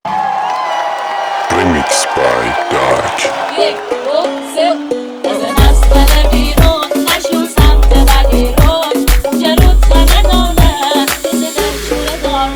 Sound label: remix lori